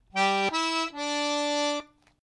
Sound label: right hand licks, Phrase one; Back Door